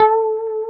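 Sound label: A 4A, compressed Strat, guitars; Spectrasonics; Hans Zimmer Guitars VOL-1; 90's Sample CDs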